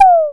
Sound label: tom, Electro-Harmonix DRM-15